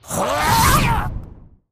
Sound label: Light Attack; wild worlds